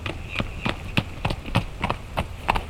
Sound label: horse trot; gait